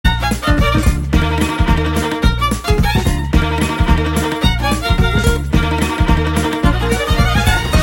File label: Love BGM